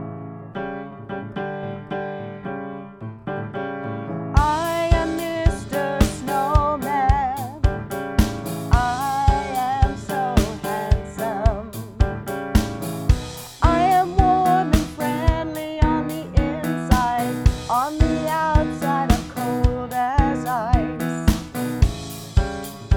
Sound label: Snow vocals